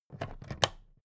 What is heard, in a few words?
plastic clasp open heavy